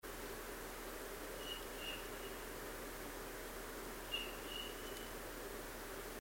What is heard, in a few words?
chirping call